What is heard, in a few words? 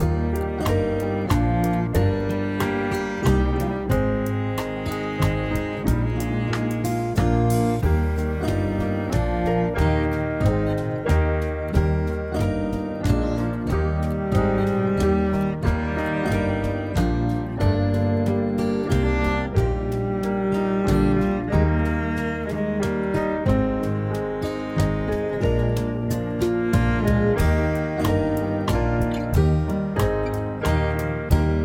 Eb High Point, brass